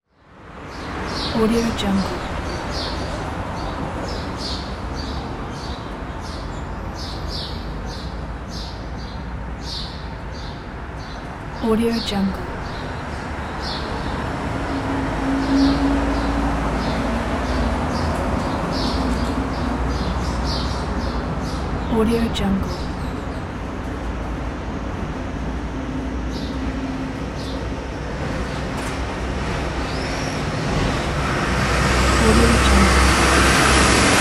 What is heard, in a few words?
city ambience with traffic